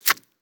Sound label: Arrow Impact Flesh; Game Weapons; Military; Game Essentials